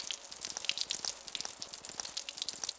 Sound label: piss; Mafie 1